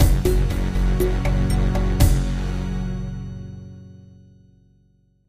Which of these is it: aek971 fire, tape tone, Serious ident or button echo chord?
Serious ident